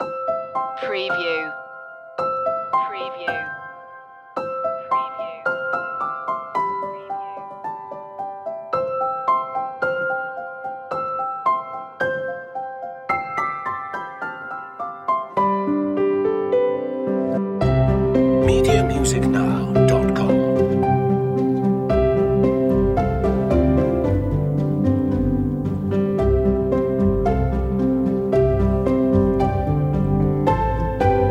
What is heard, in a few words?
warm and inspiring no percs